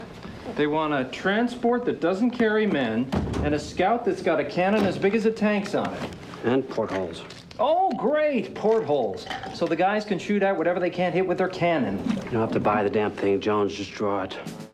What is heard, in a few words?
Egg Chant; Pent Portholes